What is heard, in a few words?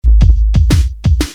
loop